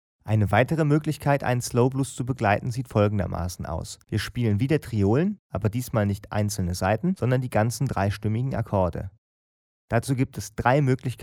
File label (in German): Riff Slow Blues, Bluesgitarre; Blues Akkorde – 1 Griff 3 Akkorde